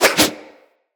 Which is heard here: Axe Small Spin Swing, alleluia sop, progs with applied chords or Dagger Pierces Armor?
Axe Small Spin Swing